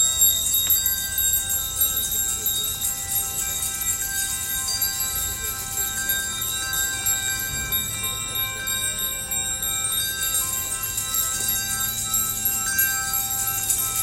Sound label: Ringing Bells for Justice